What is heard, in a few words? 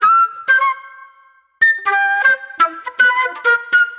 Spit Plate Flute